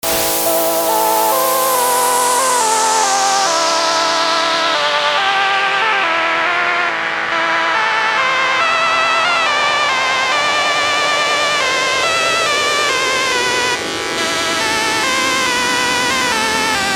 8 bit Fantasy

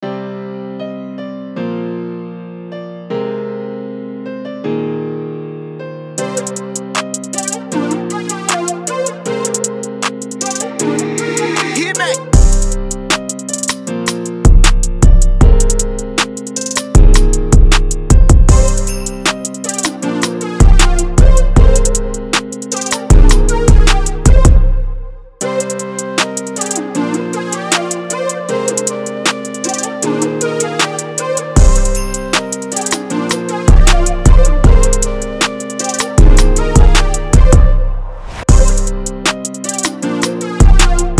piano

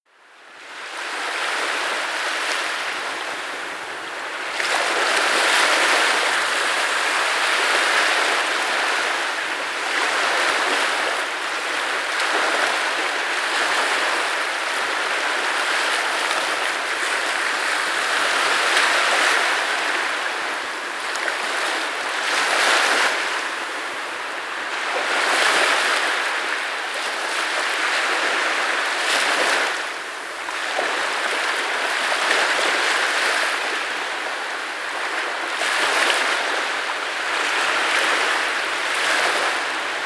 Ocean Wash

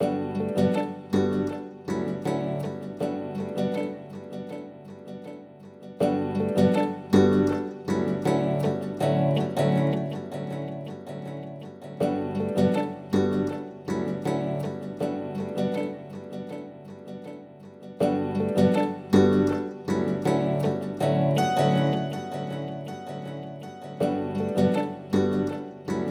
Ambience Background Instrumental Music

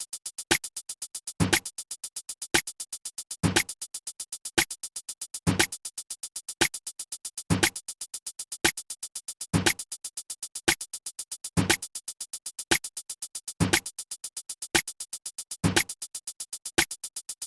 118BPM

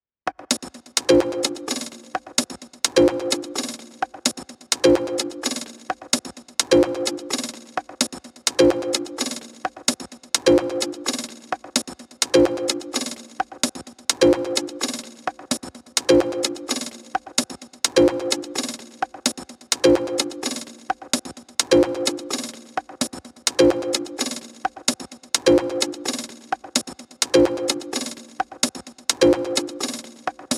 Techno Perc 128

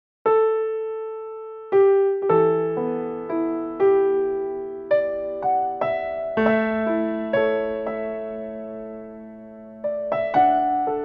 Piano

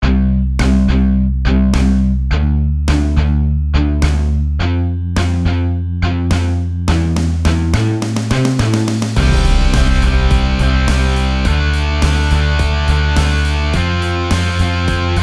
Pop Punk 105bpm

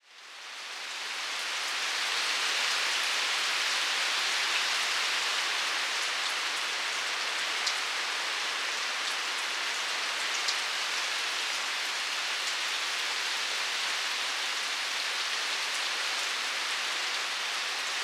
Rain Sounds

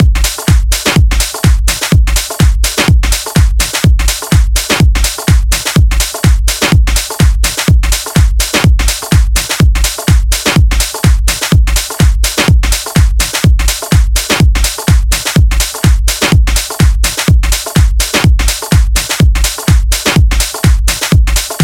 House Beat Beats; DJ Tool Mix

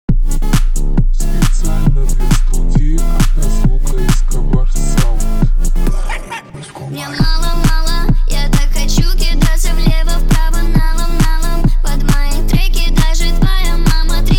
Low Bass, 26-29hz

